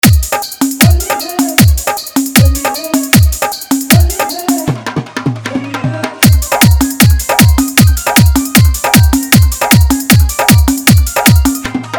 Holi Bhojpuri Hit Mix

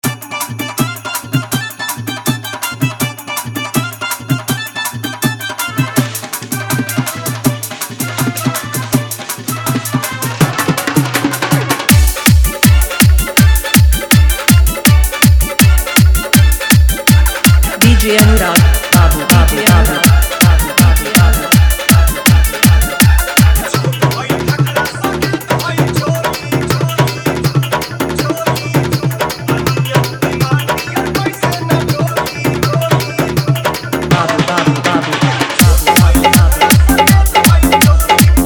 Full Dhollki Bass Dance Mix

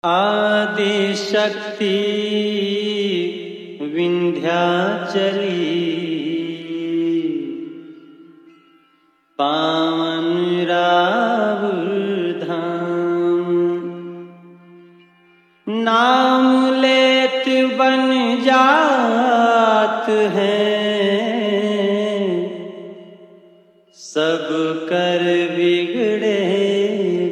Hip Hop Mix And Hard Faadu Bass Mix

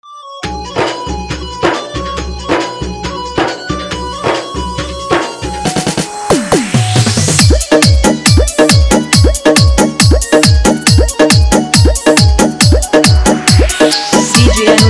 Sad Song Full Electro Bass Remix

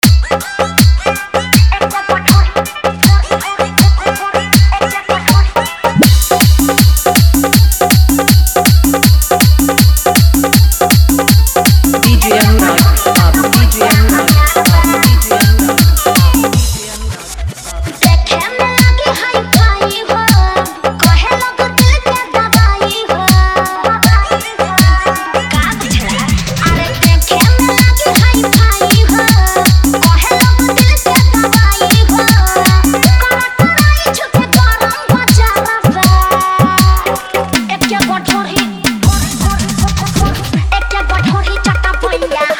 Hard Bass Dhollki Mix

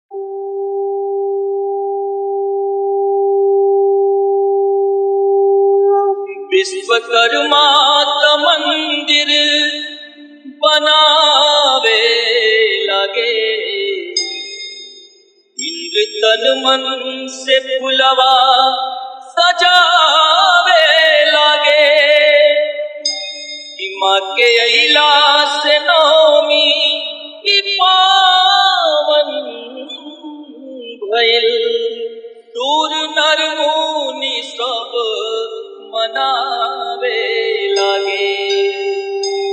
Devi Geet Bhajan Dhollki Mix

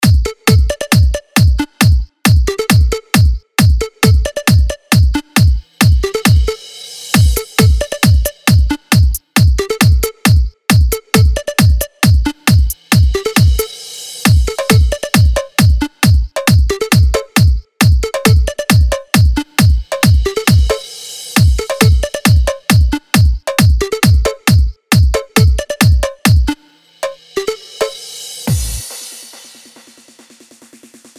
Train Beat; Maha ShivratrI Spesail Vol 2

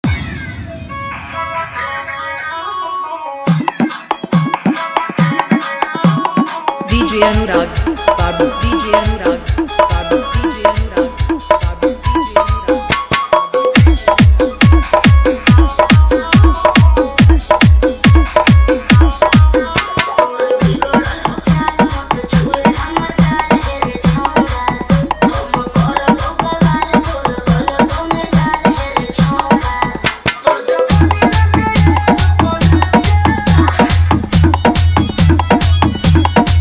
Faadu Dholki Dance Mix

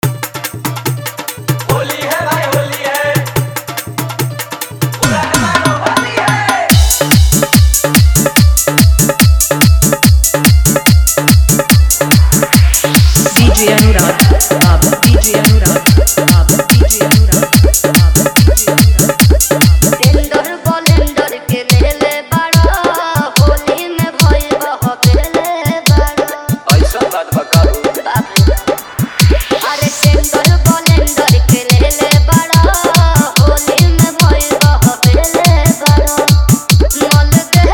Full Hard Bass Dance Mix; Holi

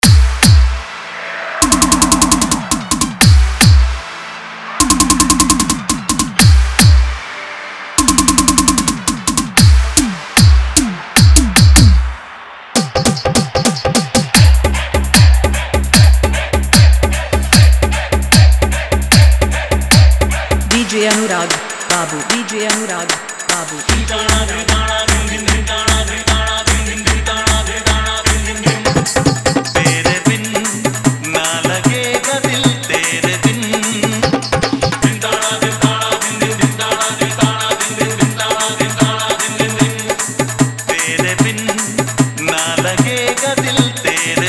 Hindi Hard Dhollki Bass Mix

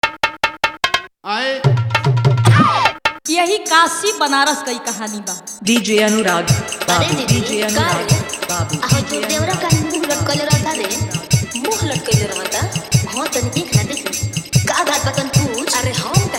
Bhojpuri Dhollki Mix; 2023 New Blast Bhojpuri Tranding Faadu Dhollki Mix

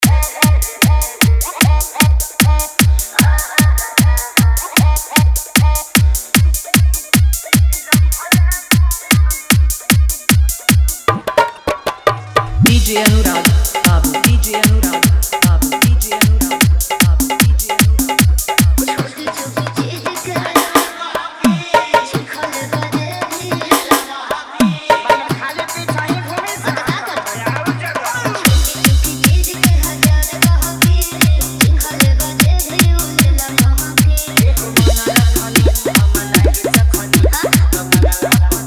Fully Dhollki Bass Dance Mix

